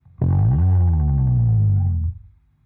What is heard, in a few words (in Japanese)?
ベースでグリスアップしたのちにグリスダウン遅い